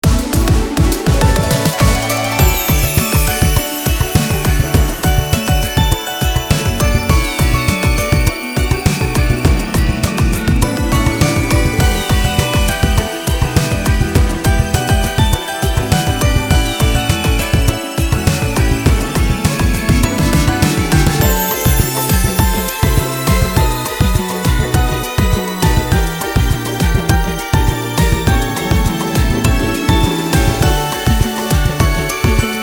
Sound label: BGM COLLECTION